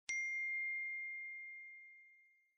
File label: "tiiiiiiiiiing" very cute sms tone